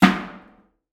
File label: Umbrella Hit In Trash Can